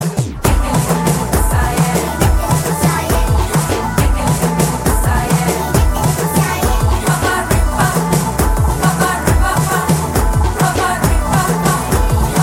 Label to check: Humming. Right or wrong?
Right